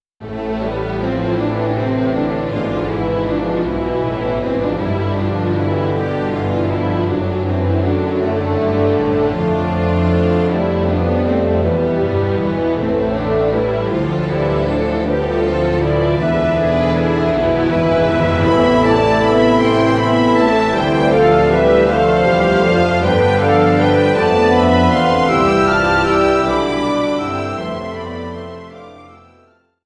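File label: background music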